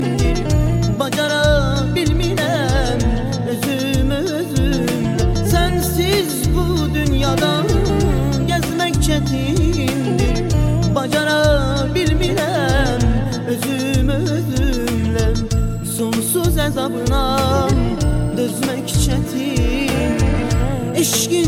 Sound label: Azeri